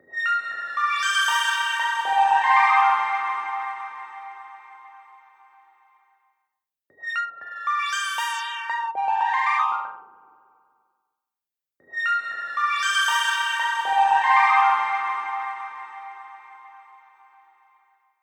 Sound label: Synth Arp; Melodic Synth; Temperance Lite